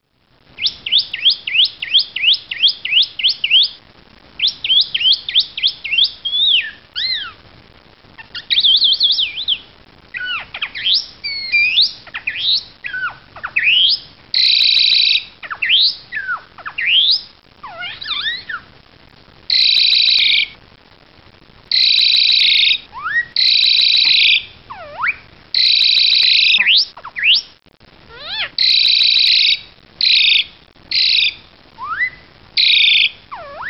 jalak hongkong

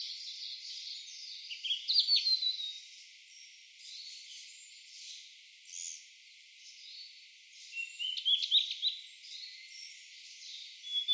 tledekan laut

